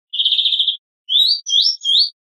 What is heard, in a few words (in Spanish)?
primaveras, verderon